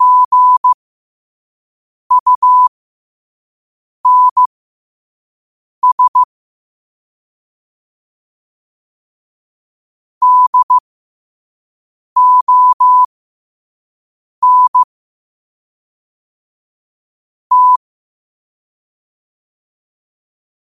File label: 5 wpm, morse